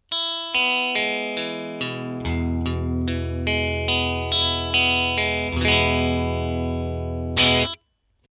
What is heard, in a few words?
jm 1968 both